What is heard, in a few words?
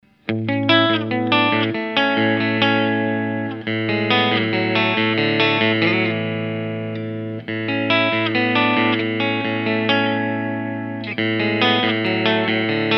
clean split, humbuckers; pickups